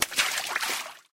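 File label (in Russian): razmashistyi vsplesk vody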